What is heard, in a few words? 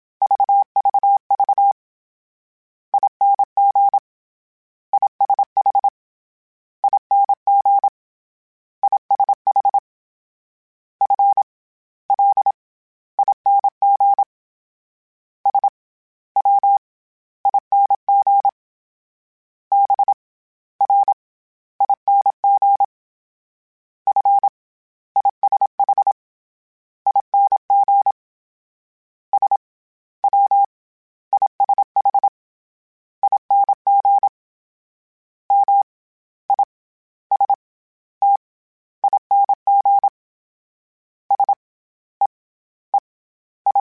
Morse Code, CW Code Groups; Mixed